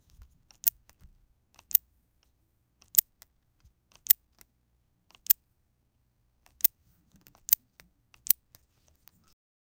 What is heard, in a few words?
the sound that slithering, reptile